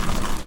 bike turn; Golden Crayon Sounds

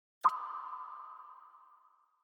Begin Notification Salient Haptic; iOS Sounds

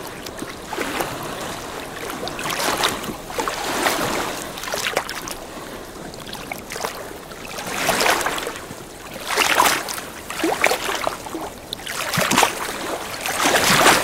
lake; Golden Crayon Sounds

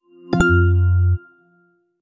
iPhone Boot Chimes Chime

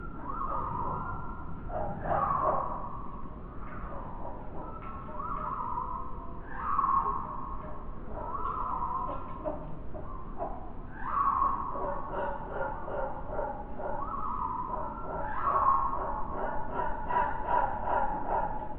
fantasy jungle forrest loop; Golden Crayon Sounds

